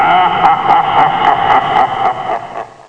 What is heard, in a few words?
Found a secret code; Sprite Fight 2002